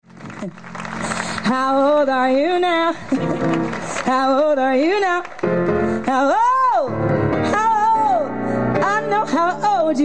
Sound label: happy Birthday Gospel Piano